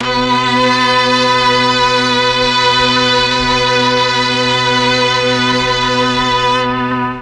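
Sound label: B2 mixed strings, mellotron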